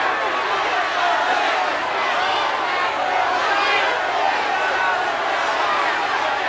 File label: Riot, disasters